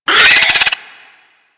Infested Biped Attack; Warframe; Moa

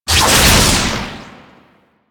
Orb Spawn; Duviri Medusa Puzzle

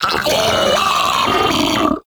Arachnoid Vocal Player Spotted; Enemies; Vocals; Warframe; Corpus; Arachnoid Hunger

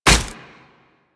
Nunchuck Catch, Weapons; Warframe; Infested

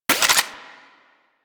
Anti Aircraft Gun Mech End; Weapons; Warframe; Archwing

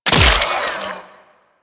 Loot Crate Cyst Burst; Ambience; Warframe; Infested Eris

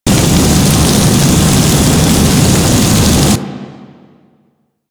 Shipyards Ship Burn Loop, Ambience; Warframe; Grineer Shipyards

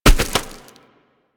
Bird Of Prey Bodyfall Dirt; Warframe; Hunting Critters

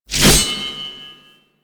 Hatchet Whoosh; Crp Venus Hatchet

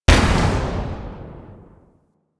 Amp Barrel Tail, Weapons; Warframe; Operators; Amplifiers; Amp Set 2

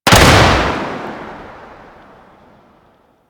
Explosion Short Burst; Warframe

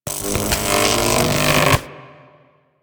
Lightning Strike Charge; Enemies; Abilities; Warframe; Teralyst; Rainalyst

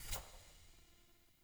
UI Default Theme Grid Close

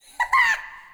Sprout Vocal Surprised; Friendly; Sproutfolk; Fey Hub Sprout

